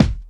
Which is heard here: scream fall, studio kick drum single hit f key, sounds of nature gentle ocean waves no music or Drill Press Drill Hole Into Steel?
studio kick drum single hit f key